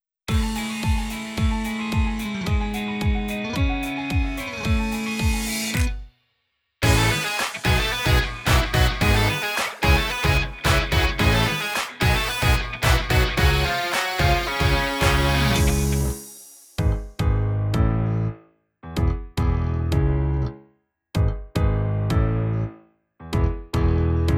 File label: off vocal